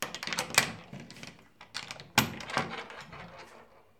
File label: Closing Safety Lock